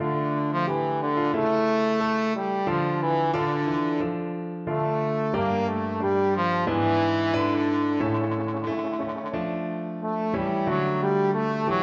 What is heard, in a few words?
sonata, trombone